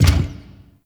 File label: Gear Shift Down, Motorcycle; Warframe; Vehicles; Motorcycle Ninety Nine